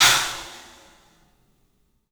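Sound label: CLUB CYMBALS; 3D Sound; 3D Drums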